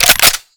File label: ar reload empty fast chamber, weapons; viper